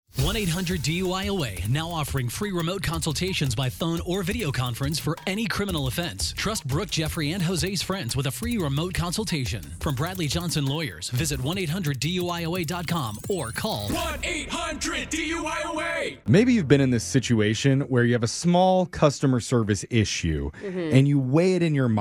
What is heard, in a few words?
phone tap sad violin complaint line; movin925